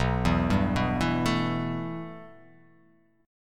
B 7th Suspended 4th Sharp 5th

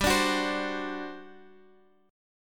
G Major 7th Suspended 4th G, Guitar Open D; 17,17,16,18,x,x; down

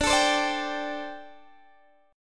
D 7th Suspended 4th, D, down, Guitar Open D

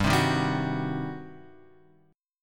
G Major 7th Suspended 4th Sharp 5th, G; GM7sus4#5 Chord {3 3 1 0 X 2} strummed

